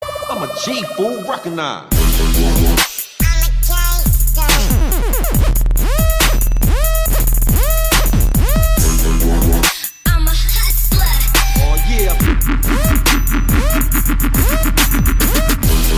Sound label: Trap And Bass Ringtone